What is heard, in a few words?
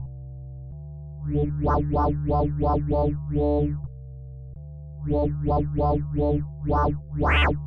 WaveShaper